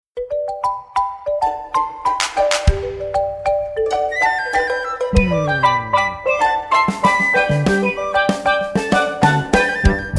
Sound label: vocals